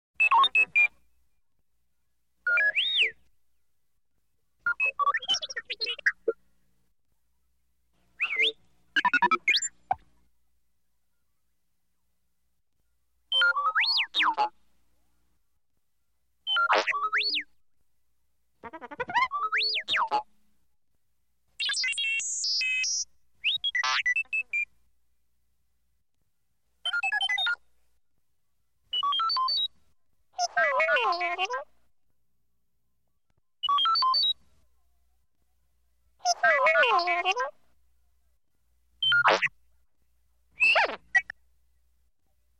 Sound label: Beeps Variety; Vox